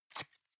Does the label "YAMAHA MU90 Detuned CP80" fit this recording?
No